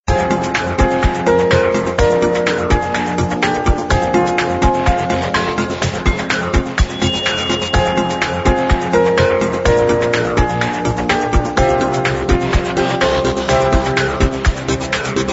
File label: Sony Ericsson; Pluton